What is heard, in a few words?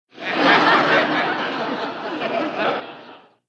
Crowd Light Laughter, medium